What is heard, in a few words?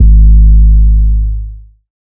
808 round Ab, Tonal; Hip-Hop; Slate; CAICOS Hip-Hop Sample Pack